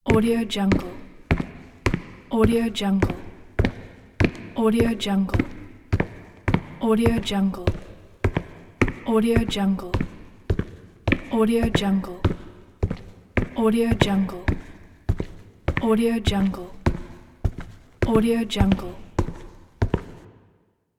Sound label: footsteps on cement in an empty hallway